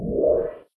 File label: portal blue; minetest; portalgun